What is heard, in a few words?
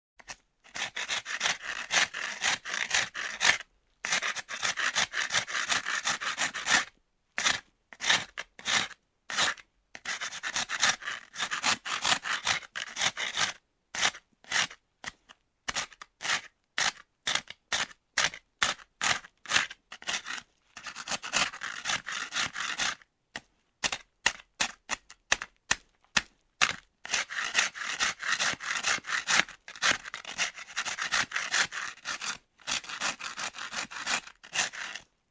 Sharpening wood stick